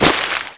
splash; Universo Animado

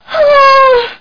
pant; Battle of the Superstars

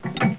door mt cl

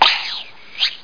pluck up

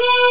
harmon, HARMONCA

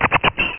decaccel; Greeblies

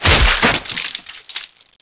SP HUM; incudemo

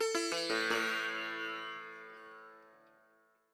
SITAR ETHNO 125 A, Guitar; eJay

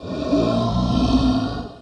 animal

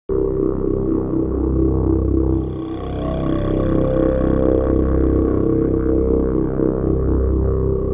loop growling synth; WMBonusXP

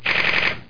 clicks

